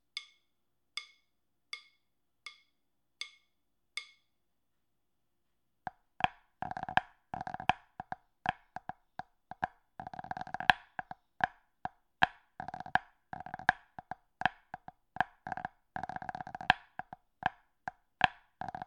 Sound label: drums 6/8; EUSPBA